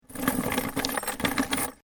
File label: Rattle Chain In Wooden Box; Metal Sound Effects; Chain sounds; LYN104 package of Chain sounds